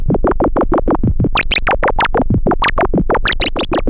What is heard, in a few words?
Moog G1; Hollywood Edge; Giorgio Moroder Rare Synthesizer Collection; MLTI.MOOG